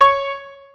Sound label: piano